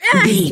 voice female hurt pain

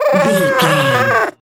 goblin fairy growl

